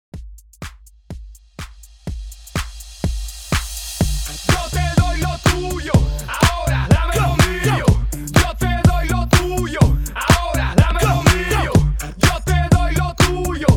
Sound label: HYPE REDRUM 124 BPM